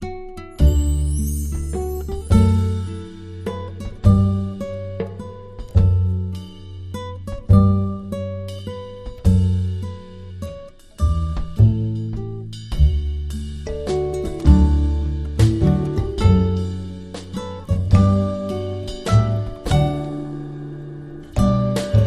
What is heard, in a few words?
The First Noel Jazz; Trad (PD)